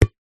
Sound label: sport ball netball cheap catch hands